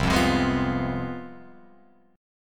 C Major 7th Suspended 4th Sharp 5th, C, down, Guitar, Open F; strummed; CM7sus4#5